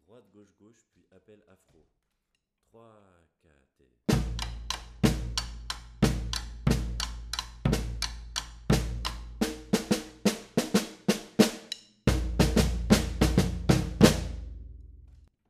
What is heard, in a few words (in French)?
Appel Afro, Batucada